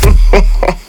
bwah ha ha

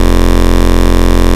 Root F, Bass

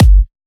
Freak Kick; 128BPM; Vengeance Samples; Freak Kit

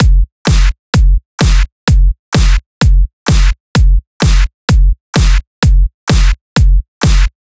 128BPM Drums; Vengeance Samples; Weekend Kit

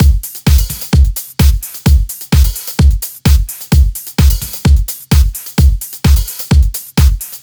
129BPM Hiphouse Kit

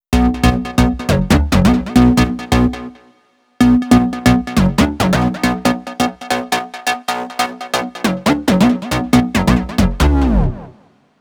Square Bass Outro 138 BPM, Bminor; Unlooped

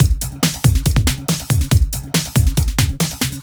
Loops BB 140, BreakBeats; Vengeance Samples

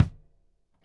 Kick, Trap

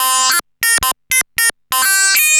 Arp E Line, ARP Odyssey; Lines; synth; musicradar-synth-samples

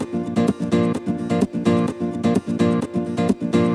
Nylon Guitar A# maj, 128BPM; Vengeance Samples; VEH3 Nylon Guitar Kit 2

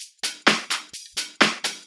Electro Loop 128 BPM; Vengeance Samples

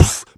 Beatbox Snare, Human Beatbox

